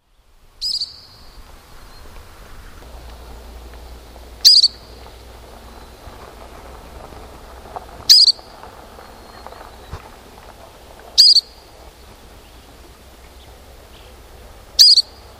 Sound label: arctic ground squirrels vocalization